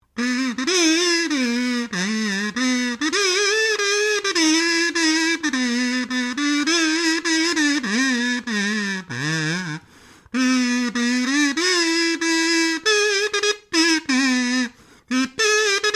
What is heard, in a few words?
KAZOO IMPROVISING STEREO, Playing around on a Kazoo; Cartoon Sound Design Sound Effects; Cartoon Sound Design (Sound Effects:Cartoons)